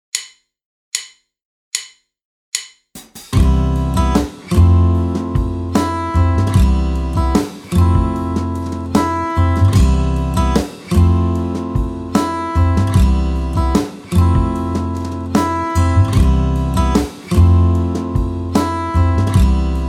liven up chords

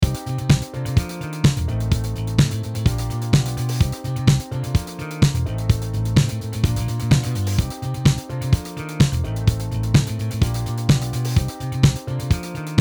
disco groove

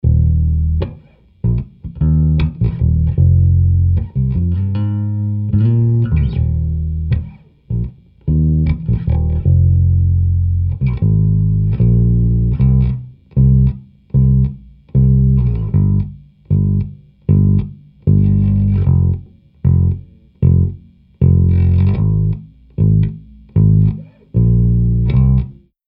bass reamped

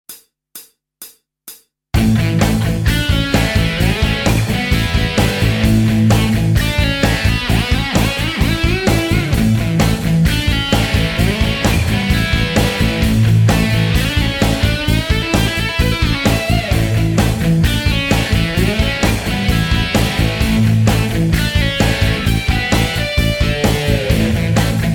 rhythm lead and rhythm

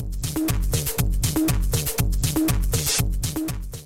parallel saturation delay

